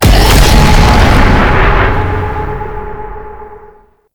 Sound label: Octopede Portal Spawn; Warframe; Duviri Murmur; Octopede Encounter